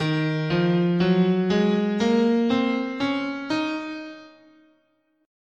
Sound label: D sharp Dorian, scale